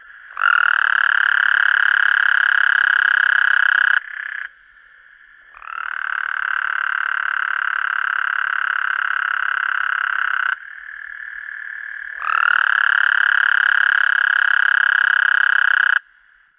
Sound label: Ollotis single